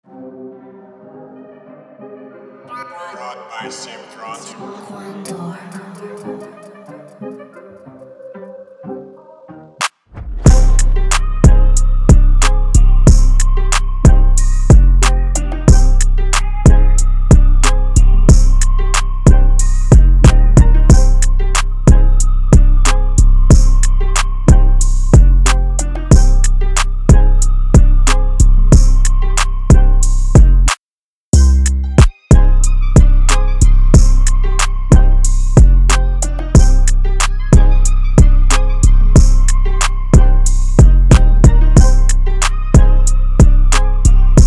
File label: Clout Trap